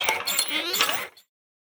Room Built

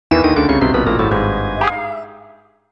Game Lose; Dungeon Girl; Gapuda

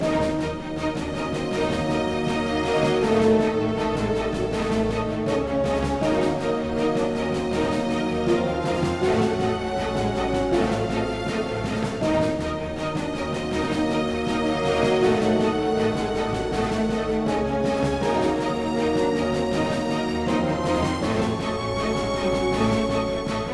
Music Fight Cold Theme Average 160bpm Loop; asterix obelix slap them all 2

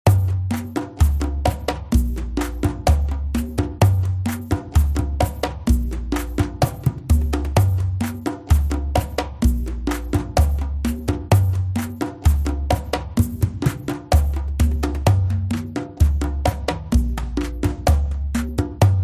Survival Arena Music Loop

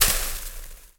Liquid Burnt; Puddle

